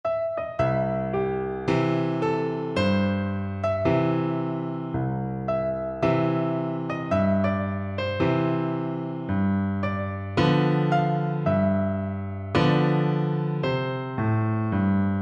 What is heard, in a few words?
Alto Recorder